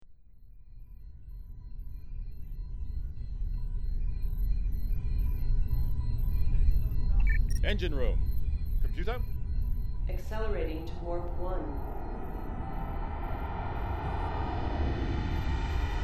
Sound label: Warp Eight